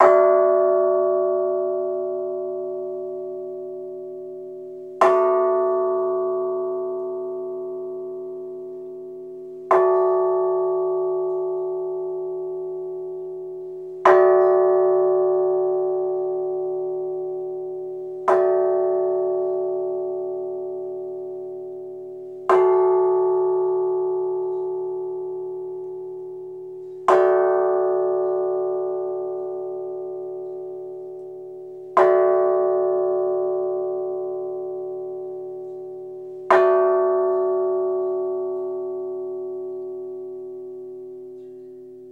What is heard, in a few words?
Bell Ring Gong, bell towers